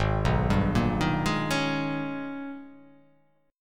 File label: Ab Minor Major 11th Ab; arpeggiated; 4,2,5,3,3,3,3,x; JGuitar